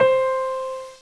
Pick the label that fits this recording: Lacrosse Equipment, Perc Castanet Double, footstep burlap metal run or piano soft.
piano soft